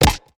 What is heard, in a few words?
Rebel Attack Impact; Android; Portal Quest